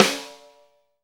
SD, Real Kit; 90 s Sample CDs; Sound & Vision - Gigapack I CD 1 (Roland); Real-Kit m11

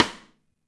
Ludwig Rim; Digital Sound Factory

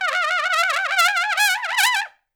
Trumpet Noise

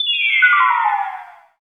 DJING; Optical Media International; Sonic Images Library; SI FX Vol 21

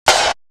Drum, Drum One Shots

